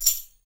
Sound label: TAM, NYC Percussionworks; Sampleheads; 90 s Sample CDs